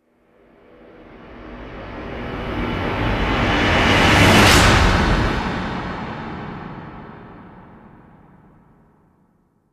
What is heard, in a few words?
Trauma Hit Swell; Ninja Tracks